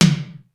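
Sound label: low super tom; sr16 tom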